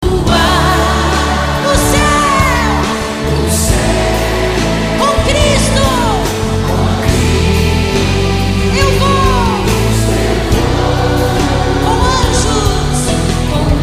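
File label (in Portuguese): ao vivo, gospel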